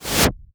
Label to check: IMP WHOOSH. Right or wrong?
Right